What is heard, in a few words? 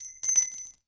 Nails Falls on Ground; Tools; 1001 Sound Effects